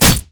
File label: spider basic attack; Elise